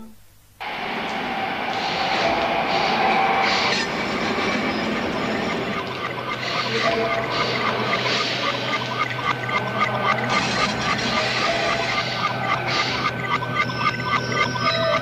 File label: jingle; 13eme rue